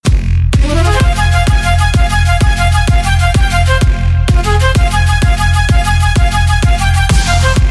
flutes

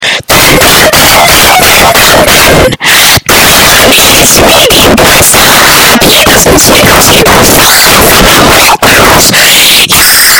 earrape; amogus

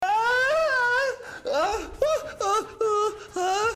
ahhhh uh uh uh

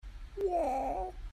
woah

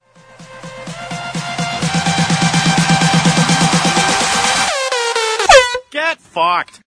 bass drop

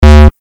denied

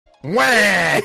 weeaaah

